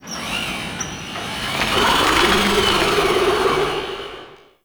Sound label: Ship Metal Torture; Warframe; Corpus Ship Remaster